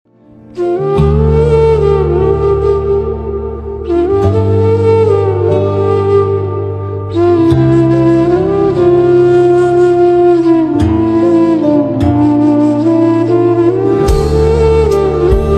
sad background music bangla sad ringtone; bangladeshi ringtone

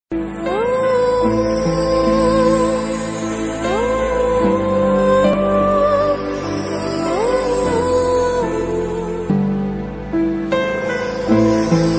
sad bgm; oye

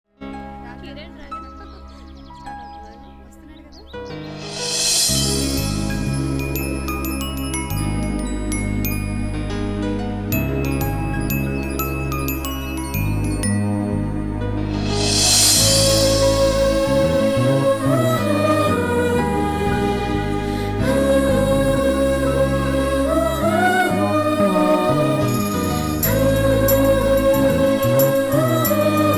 anandam bgm music

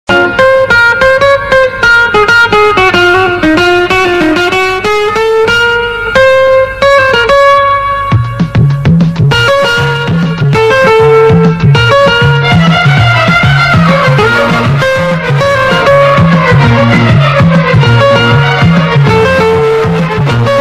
8d bgm ringtone; yugapurusha kannada movie

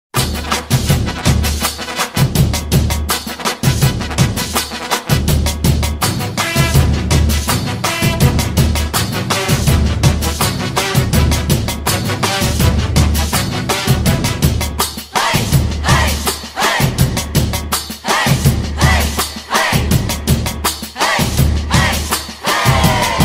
tamil bgm ringtone; virumaandi

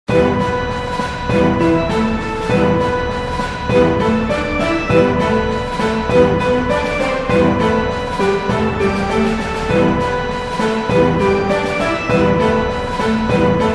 spy missions ringtone instrumental ringtones

